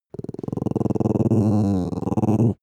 dog small growl soft

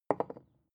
glass put down wobble short

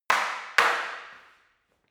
staff wood fighting double

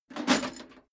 metal plate armor movement single

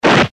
eastern swishes

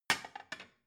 plate shelve soft

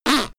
suck in

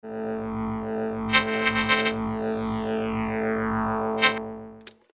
id scanner

